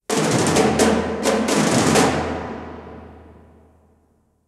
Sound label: Percussion; Rambo - First Blood Part II